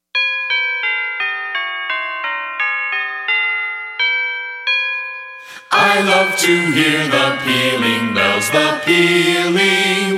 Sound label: Pealing Bells; A Beka